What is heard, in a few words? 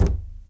Thump Big